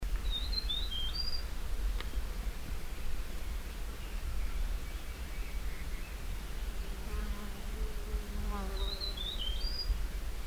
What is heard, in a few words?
Huets Fulvetta Song, Fujian Dai Yun Shan; alcippe de huet alcippe hueti